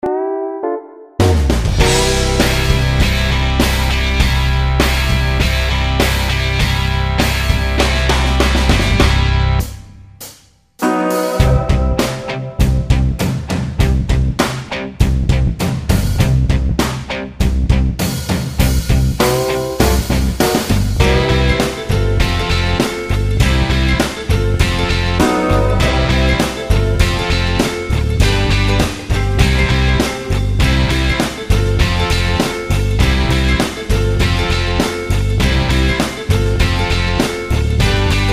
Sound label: rock garage ukulele; slow; carefree